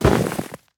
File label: snow golem death, minecraft; snezhnogo golema